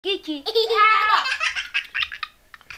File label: Child Laugh